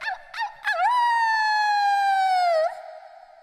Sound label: short awoo